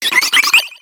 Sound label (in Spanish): Grito de Woobat